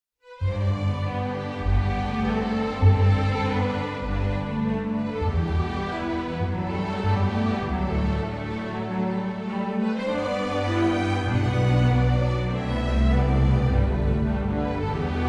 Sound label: 3 layer strings texture